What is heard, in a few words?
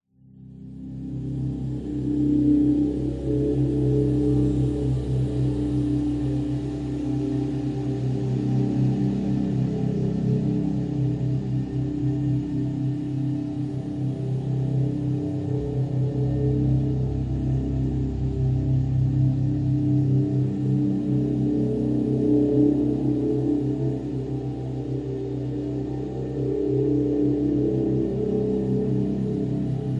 science fiction atmosphere dark resonating drone